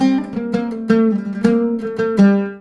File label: LUTH, Arabian Traditions; USB Soundscan vol.43; AKAI